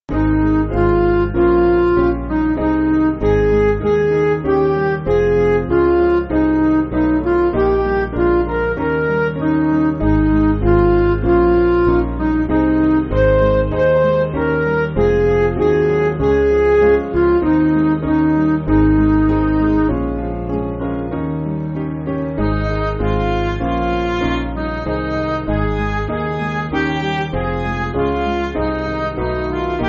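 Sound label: Music Backing Files for Churches; Saviour Breathe; Evening Prayer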